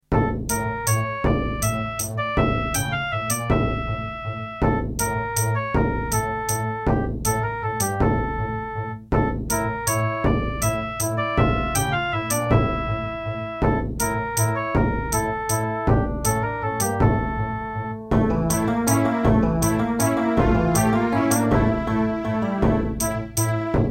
Creative Midi Blaster MB10

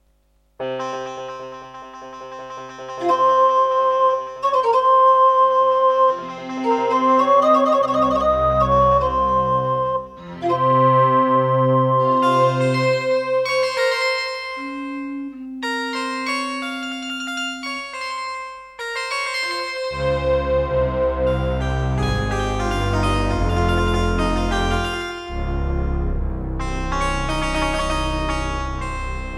Roland SCB-7; Prince of Persia 2 - Intro

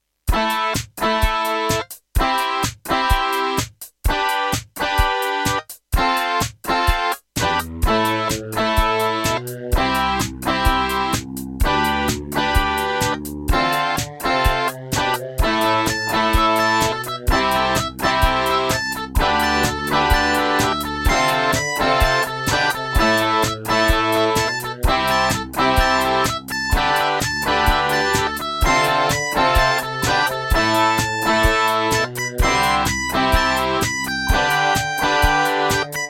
Creative Wave Blaster; raptor call of the shadows

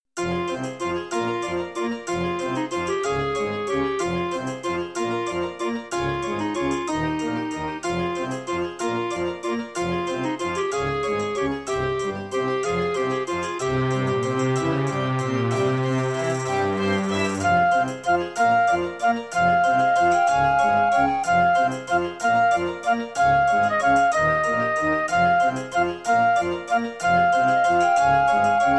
Roland Edirol SD-20 GS MODE; Death Gate

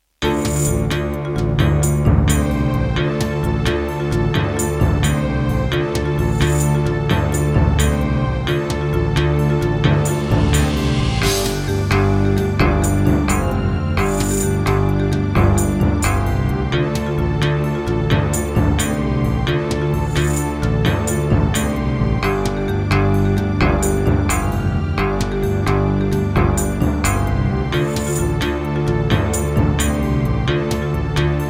Yamaha DB51XG GS MODE, wavetable; Heretic

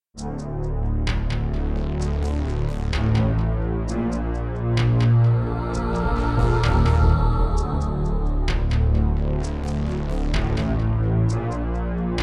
Yamaha MU500 GS MODE; Descent II

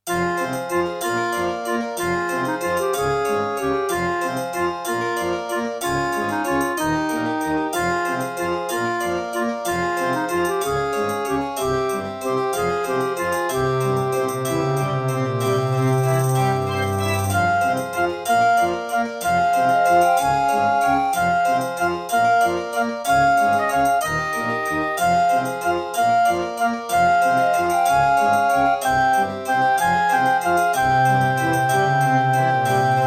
Track 6, Roland SC-88; Death Gate

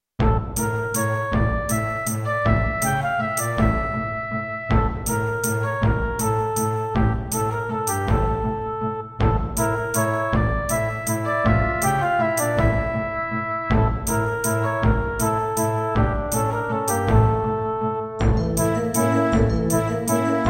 Roland SC-55mkII

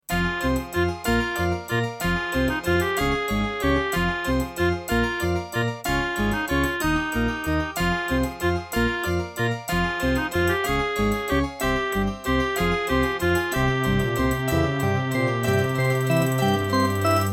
Track 6, V7 media fx; Death Gate

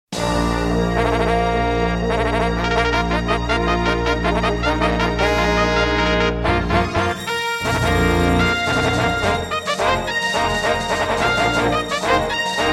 Intro, Akai SG01k; Dark Forces

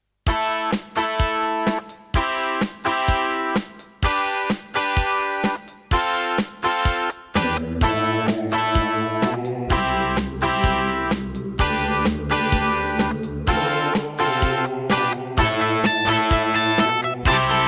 Roland CM-300; raptor call of the shadows

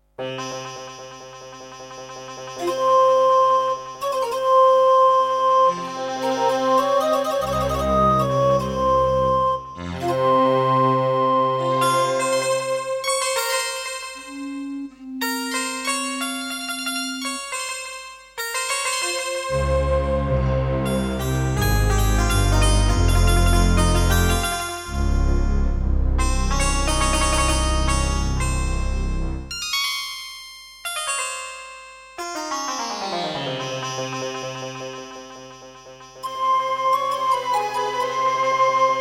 Anteg EasyMIDI EM-100; Prince of Persia 2 - Intro

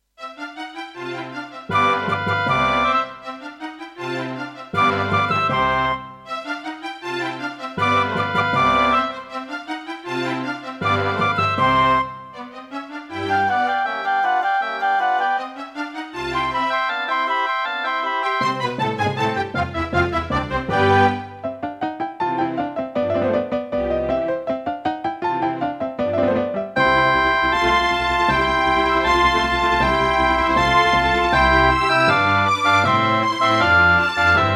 Mozart - Concerto K467 partial, Roland SC-88 Pro

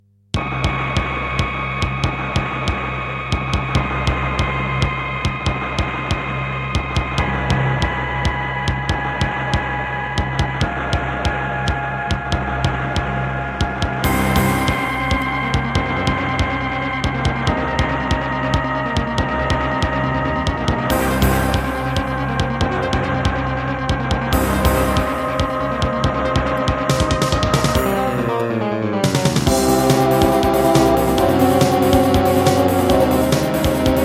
Ast Advantage DBII A; sigil doom episode 5

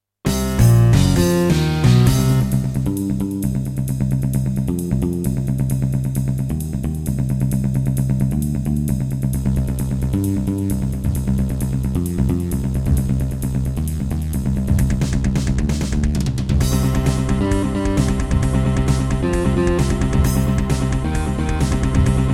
Yamaha DTXpress; sigil doom episode 5

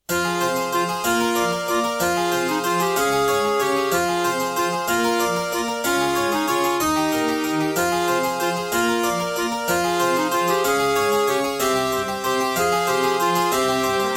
Serdaco Dreamblaster X2GS BURAN V1.1; Death Gate

